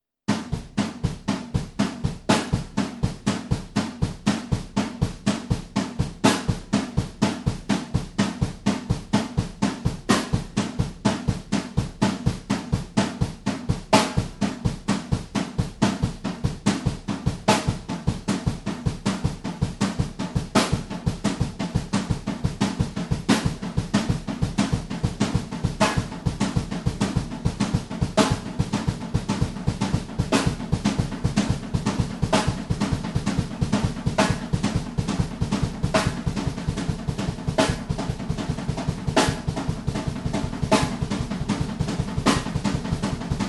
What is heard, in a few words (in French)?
exercice, batteur